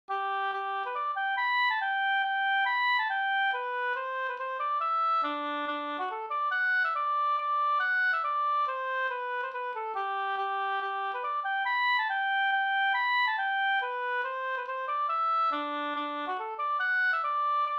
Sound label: mazurka; Flickan med polkaharet